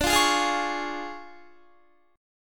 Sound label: D Minor Major 7th Flat 5th, down, Guitar; DmM7b5 strummed; JGuitar